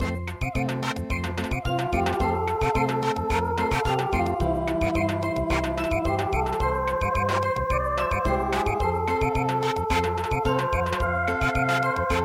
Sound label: Samba, midi